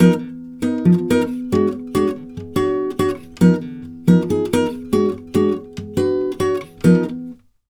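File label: 140 guitar F diminished, brazil bossa; USB Soundscan; AKAI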